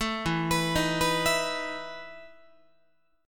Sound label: E Major 7th Suspended 4th E down Guitar; EM7sus4 arpeggiated